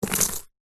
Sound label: dog leash metal chain drop carpeted floor; animals